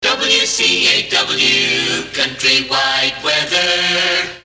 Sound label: jingle Country Wide weather; Station Identification